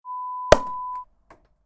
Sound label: Cork Pop sound